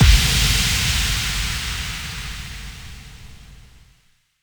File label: FX Boom